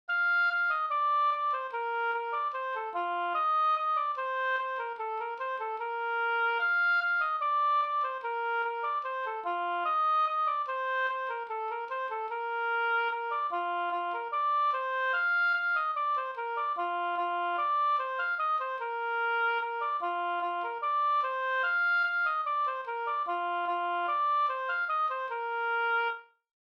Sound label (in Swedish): anglas